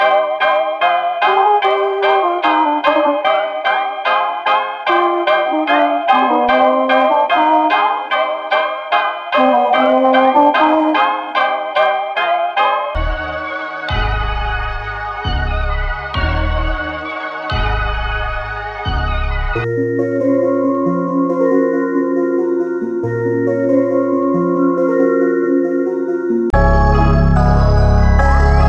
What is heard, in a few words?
melody loop trap